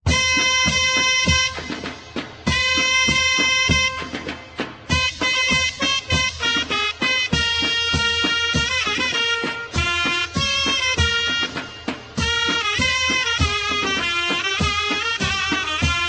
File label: Slow Military March; Krrish; CeddinDeden